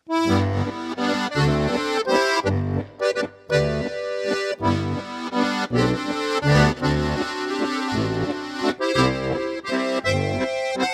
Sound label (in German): 2 Chörig Flach Walzer ADGC